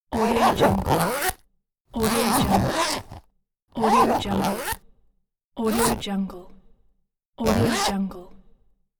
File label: small box zipper; orange-sounds